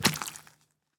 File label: drowned step